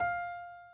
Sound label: key